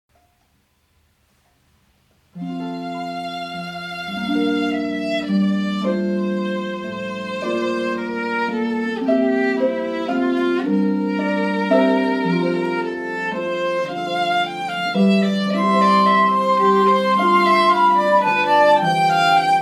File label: Adante from the Double Violin Concerto, chamber music trio